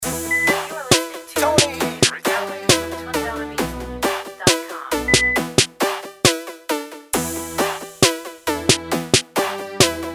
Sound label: Trap